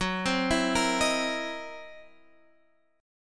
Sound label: C 7th Sharp 9th Flat 5th F sharp